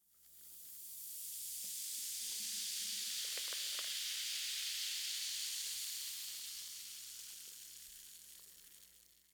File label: Household Soda Fizz